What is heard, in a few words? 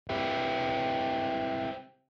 guitar Cycle pan LPF